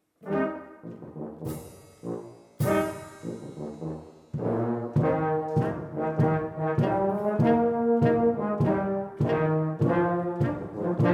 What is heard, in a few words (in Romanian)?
Fanfara